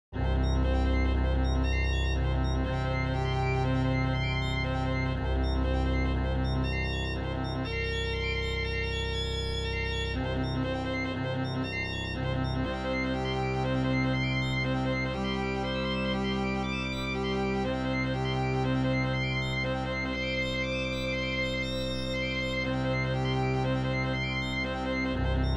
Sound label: quartet